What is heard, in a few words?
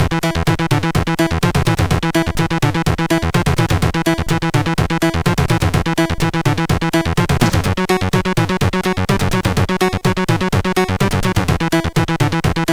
Jingles, MOS6581R4 sid